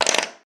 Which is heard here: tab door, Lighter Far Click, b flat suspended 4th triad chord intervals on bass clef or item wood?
item wood